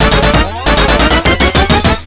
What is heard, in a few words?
Zero Hour (Warning); Space Invaders Extreme